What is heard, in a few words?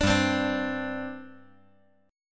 G Suspended 4th